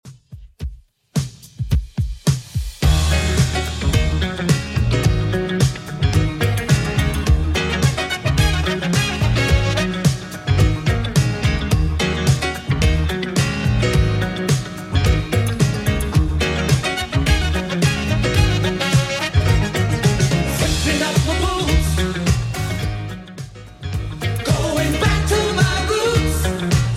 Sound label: 80s Redrum 108bpm; remixplanet